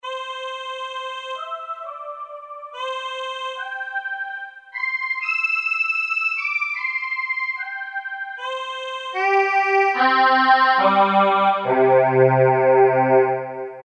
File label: VOX; Akai SG01v